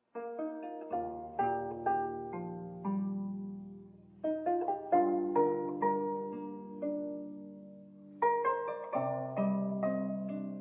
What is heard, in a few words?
before departure music; Train Announce